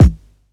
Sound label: kick; eddyflux; crate bd; dough-samples